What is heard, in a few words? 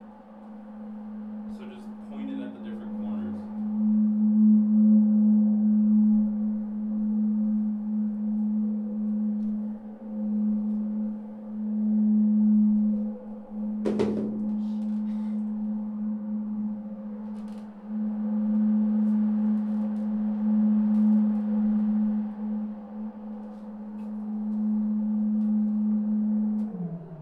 Box; EMPAC